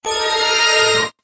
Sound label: chime; raising heart; nanoha